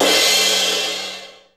CRASH; Crypton - DrumBase5000

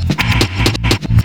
DRUM FILL

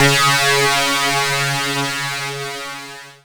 C#, Synclav FM PLUCKED; Big Fish Audio

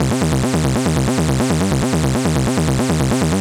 140 Cm, Melodic Loops; M8; Electronisounds

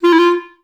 F3, Advanced Orchestra; Best Service

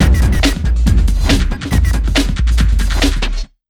140, Big Beat Drumloops; USB Soundscan vol.25; AKAI

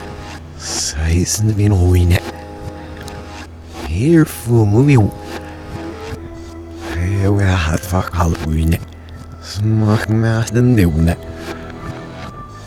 Vocal Reverse; Sonic Foundry; Rondo Brothers Trip-Hop Loops & Samples

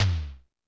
Toms 1, drum machine

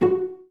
CL PIZZ F3; Best Service; Peter Siedlaczek's Advanced Orchestra

